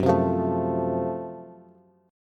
F sharp Minor Major 7th Flat 5th, Bass; chordshape; down

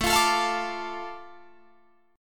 A Suspended 4th Sharp 5th, Guitar Drop D

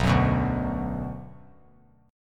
B-Minor; strummed; JGuitar

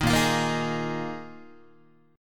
A sharp 7th Suspended 4th, Guitar; strummed; JGuitar

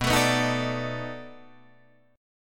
B 7th Sharp 9th, down, Guitar, Open D

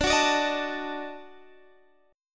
D sharp Minor Major 7th Flat 5th D

